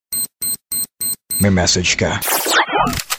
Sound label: Msg Alert